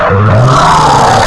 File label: confused, antlion guard; hl2